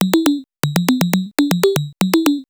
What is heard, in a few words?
MOOG HAT; AMG - Vince Clarke Lucky Bastard